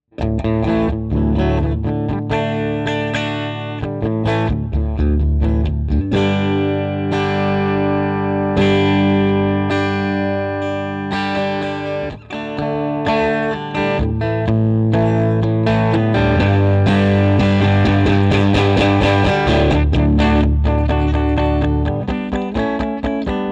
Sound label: Tweed Deluxe Narrow Panel